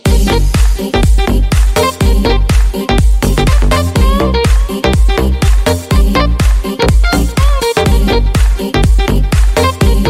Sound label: Cool House